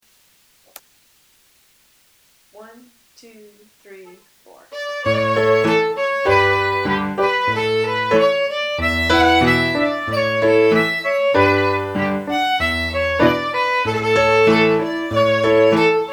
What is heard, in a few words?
Piano and Fiddle Medium; Miss Charlotte Alston Stewart